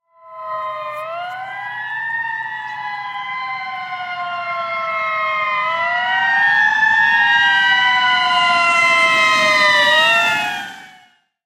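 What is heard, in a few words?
emergency vehicle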